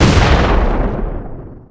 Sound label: balls destroyed; 祖玛